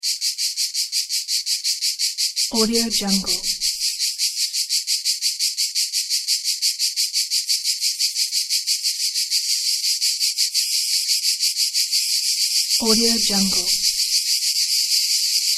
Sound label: cicadas mediterranean